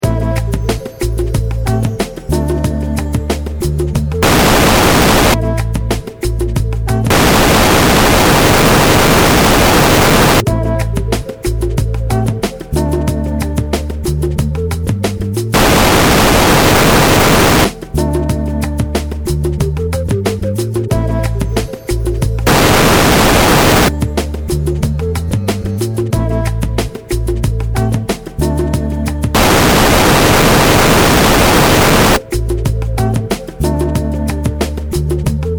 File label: many noises; lebanese